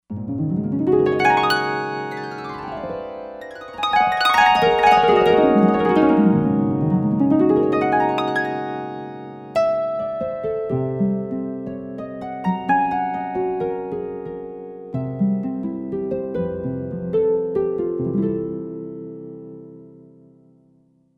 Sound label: Pearly Harp